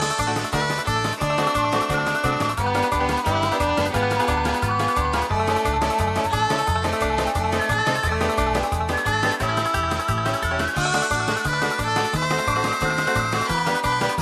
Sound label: slider race theme; super mario 64